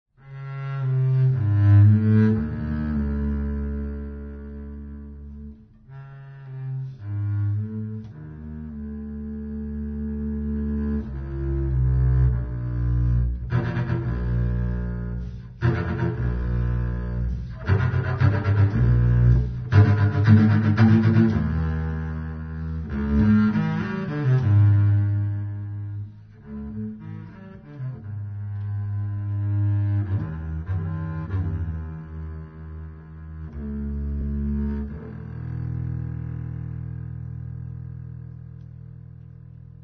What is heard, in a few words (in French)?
Contrebasse comtemporaine; louis-antoine lassonde; inti raymi